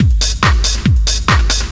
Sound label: Dance Drumloop